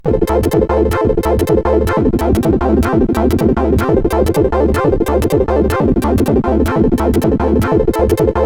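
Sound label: MOS 8580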